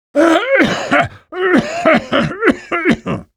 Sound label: fish cough; Half-Life 2 Lost Coast